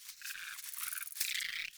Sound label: Venus Landscape Close Texture, Ambience; Warframe; Venus Open World